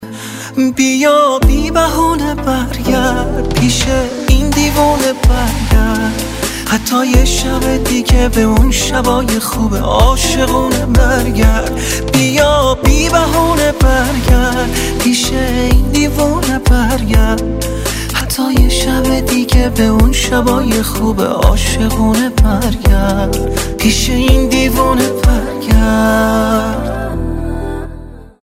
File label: Ringtone